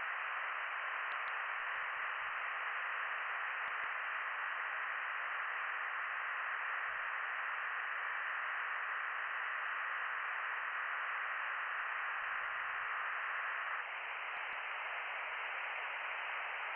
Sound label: from radio, 7177KHz